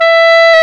WND HARD, Clarinets, Clar Bryt

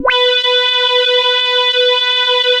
CHUNKY B5, Drums; Drums In Your FACE!

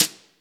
BRUSHES, 12-Bit Drums; E-MU Formula 4000 Series Vol. 1; Hip Hop Nation

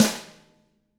DW HIT, Drums; DW KIT1

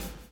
BLOW; Spectrasonics - Backbeat

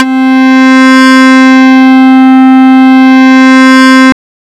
SY BUZZ; ModelCycles

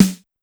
Snare, Clavia DDrum-AT, Pitched Up

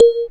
A#, Synclav FM PERCUSSION; Big Fish Audio

